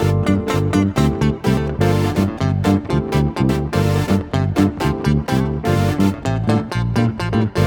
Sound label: Backing, 125bpm Dm, Nu Disco; Zero-G; Construction Kits; Speedy